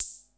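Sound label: CR68 open hi hat, drum; Crypton - DrumBase5000; MSX KIT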